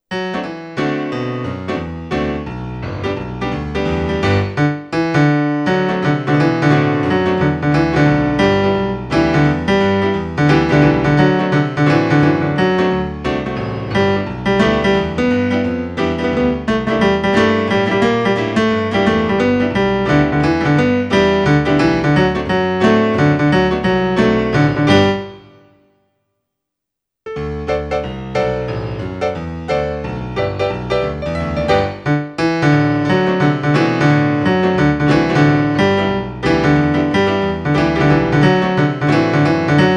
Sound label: melody